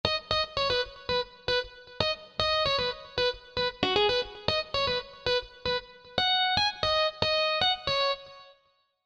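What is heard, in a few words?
B 100 bpm, Pop & Future Pop MIDI Melody; Grin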